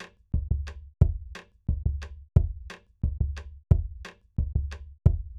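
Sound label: Bombo, Hip Hop Exotica, A minor; Big Fish Audio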